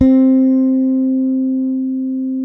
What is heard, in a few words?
bass, sustain; Sampleheads; Will Lee Bass Library